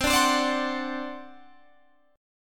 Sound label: C Suspended 2nd Flat 5th C down Guitar Open G